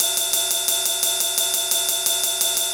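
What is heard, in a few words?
ride, perc loops, 175, drum and bass; EZRollers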